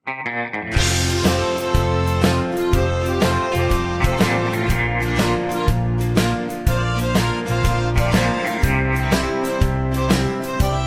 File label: F No Backing Vocals, backing tracks